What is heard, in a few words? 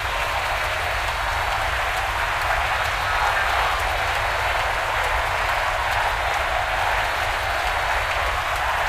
crowd Ambience; netent